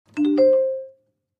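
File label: Clavichord Short Arpeggio; keyboards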